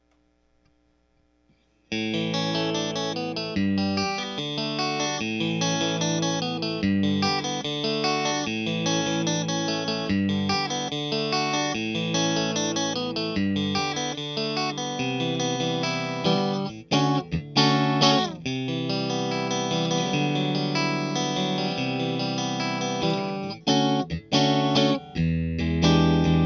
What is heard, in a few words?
strat channel 1